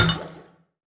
Clank; Lewd SFX

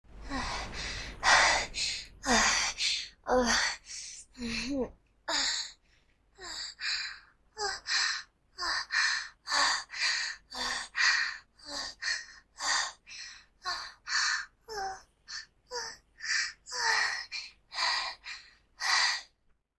20 MORE Seconds Of Moans, Moaning; SUPER Short Audio

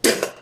Spit

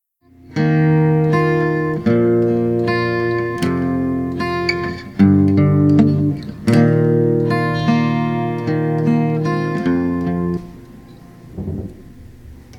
GUITAR, 154BPM

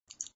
Suck; Lewd SFX

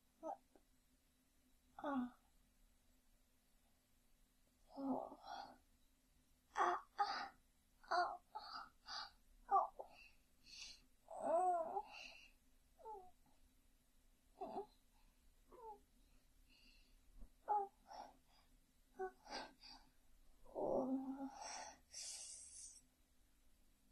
Needy Noises

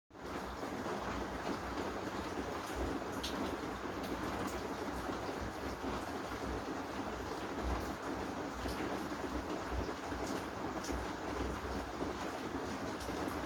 Rain

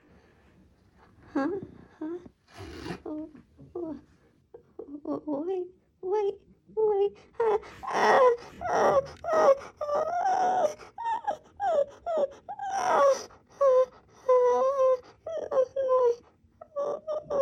moaning

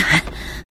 Grunt; Lewd SFX

